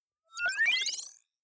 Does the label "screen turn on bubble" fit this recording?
Yes